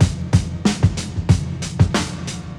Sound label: beats